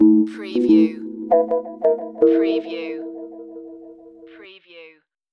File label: Hip Hop Synth Licks 90 BPM - A - Straight